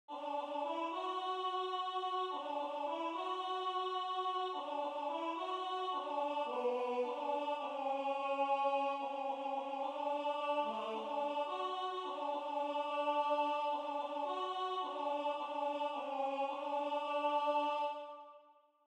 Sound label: Alto